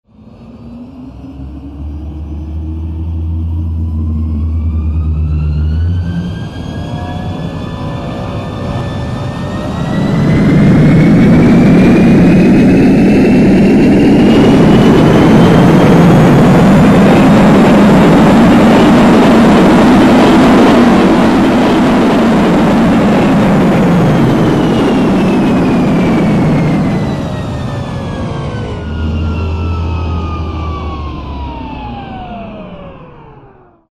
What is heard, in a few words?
Harrier Jump Jet